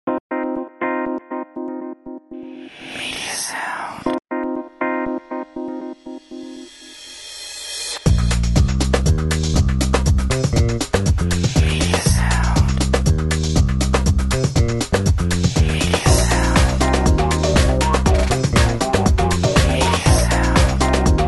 Fonk - 120BPM, House - Dance

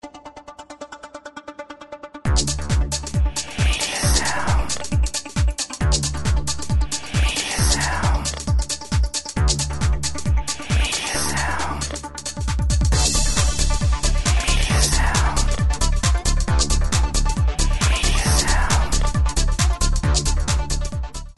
House Dance 135BPM

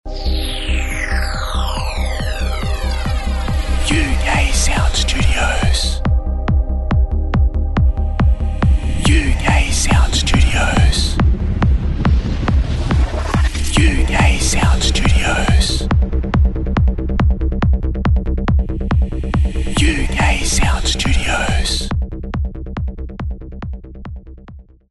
Trance Tunnel, House - Dance

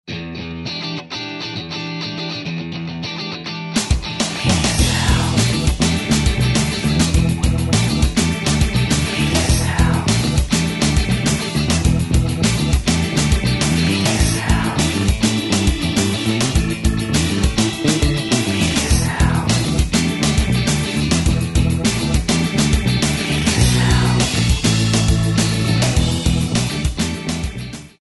Chat Show - 102BPM, rock

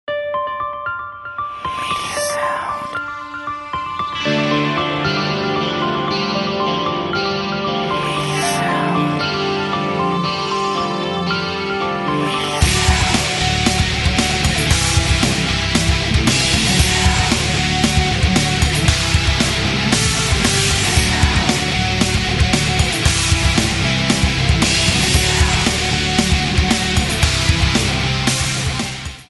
Rock, 115BPM

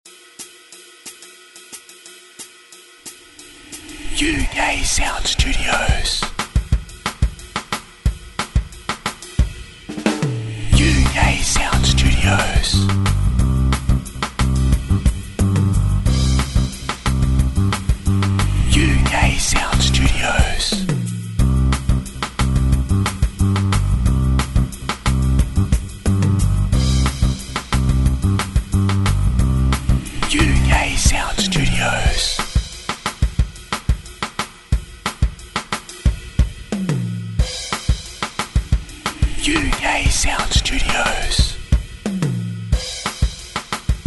Bass Bounce, Easy Listening

